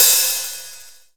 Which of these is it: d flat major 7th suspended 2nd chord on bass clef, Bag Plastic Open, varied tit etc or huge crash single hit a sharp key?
huge crash single hit a sharp key